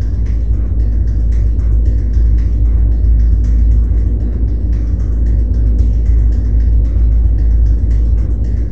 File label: Gear Amb loop; Nexus